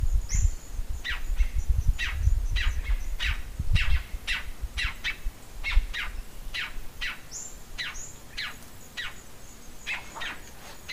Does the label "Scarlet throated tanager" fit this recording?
Yes